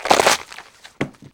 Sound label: Limb and Flesh rip and chewing; RadwinIsland